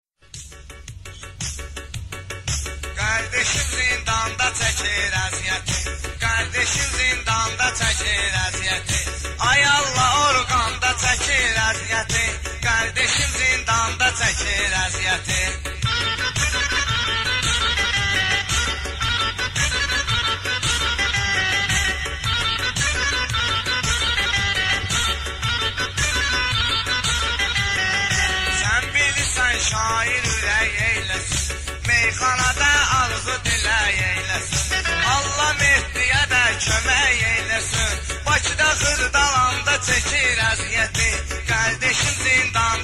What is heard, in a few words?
Azeri bass music